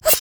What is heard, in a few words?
blinding dart; LoL; teemo